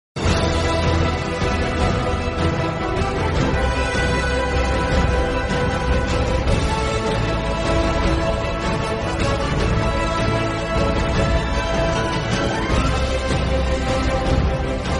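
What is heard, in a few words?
background music; zelda; breath of the wild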